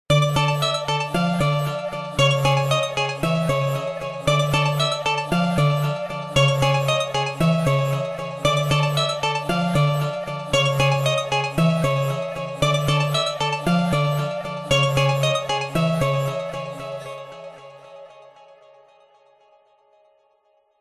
game show musical jingle timer countdown digital synth